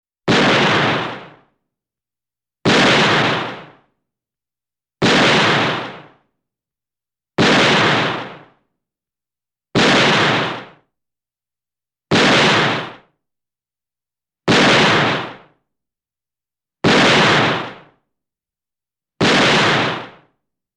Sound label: Vintage movie shot sound